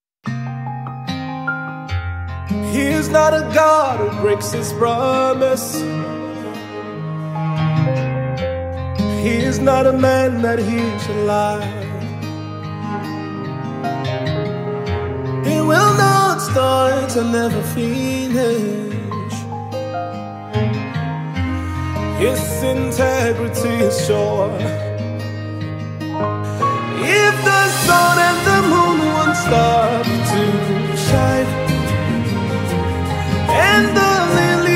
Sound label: Acoustic version